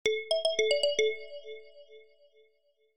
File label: new order notification; kitchen-agent